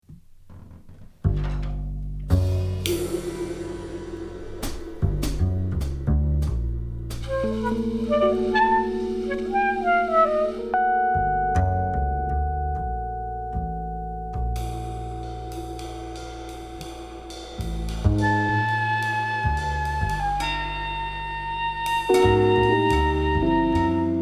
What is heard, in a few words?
quartet